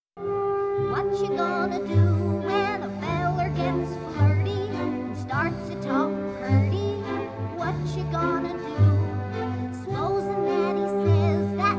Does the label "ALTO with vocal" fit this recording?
Yes